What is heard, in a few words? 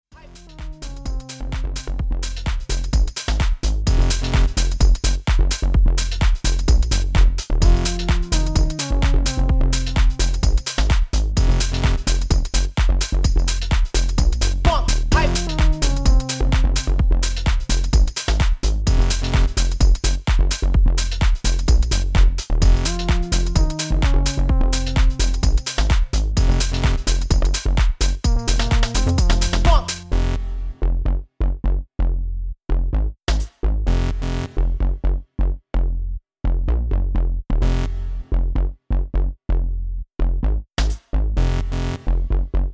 High Funk; Deep House - Tech House - Progressive House